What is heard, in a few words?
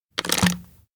pen into bottle; anemoi